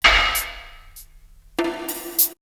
Percussion; Coldcut's Kleptomania VOL1

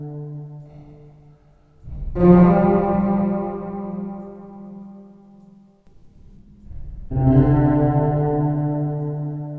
SHINN 100 G, Bass Grooves; USB Soundscan vol.30; AKAI

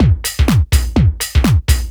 loop 125bpm, House Drum Loops

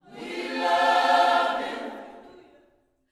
WE LUV HIM, Vocal Planet Gospel; Spectrasonics; GOSP PHR

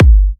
G, kicks; markus hakala kicks

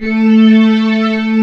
FILM FLANSTR, pop brass; AKAI S6000 CD-ROM - Volume 1; BrassWind

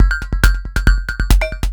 percussion loops, Techno; PS-45 WAV Techno ID

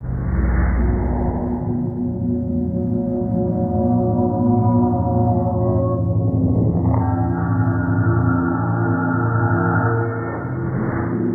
SUB WAVE, Special FX Twisted; USB Soundscan vol.14; AKAI

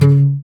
GUI AC, Acoustic Slap, Roland

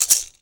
SINGL RATTLE; Best Service; World Colours

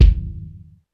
BD JAZZ, Korg Electribe EMX-1

